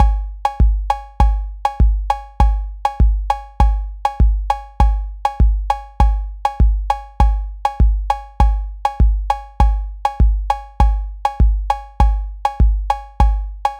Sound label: tresillo